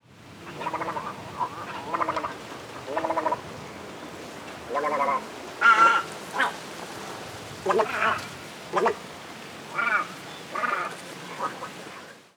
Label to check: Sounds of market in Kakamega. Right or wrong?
Wrong